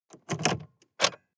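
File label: sports car interior key pull out; verkehr transport; autos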